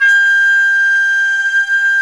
WND FLT MT, Flute & Mute

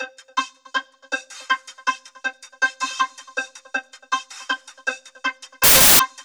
fast vocoder; Zero G; Malice In Wonderland

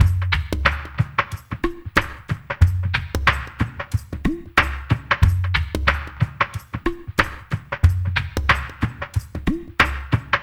CAVERN, Liquid Grooves; Spectrasonics; Liquid Grooves 1 & 2; CAVERN SW

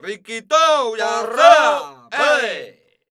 flamenco vocals, male

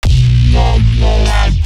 Growl Bass; neuro; BIG5AUDIO

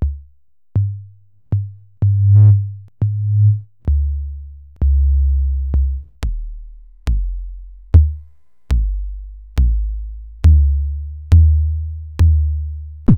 Analog-Solutions Concussor

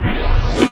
FX, Drum & Bass, 150 BPM; Ueberschall; Drum & Bass Resonance

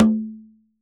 CONGAS; Q Up Arts; Denny Jaeger Private Collection

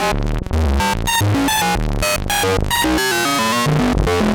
Random, LFO & Noise

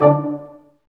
BRS, Staccatos, Ensembl stac; Roland L-CD702

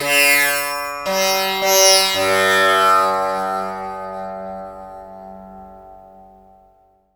TAMPURA; Spectrasonics; Heart of Asia

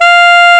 F3 SMO SAX; REED; AKAI S-Series CD-ROM Sound Library VOL-8

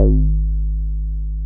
G#1, Bass; E-MU Producer Series Vol. 6 – Analogia Project (CD 1); Boom da Bass 2

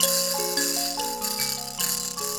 PLK Bush Mbira

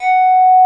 BASSVOX; Masterbits; Vintage Voltage; SQ+FX

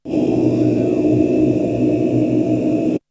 Synth; ZERO G - Datafile DISC 2

